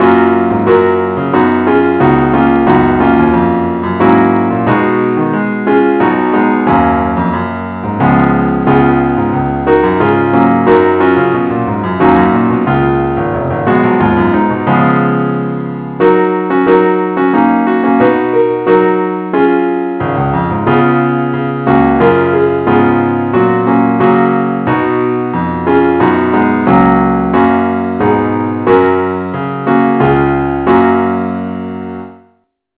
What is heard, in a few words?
keyboard only key fis; i need thee